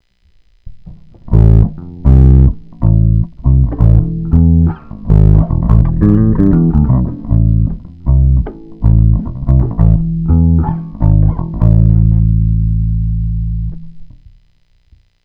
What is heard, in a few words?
peavey same gain; gain modification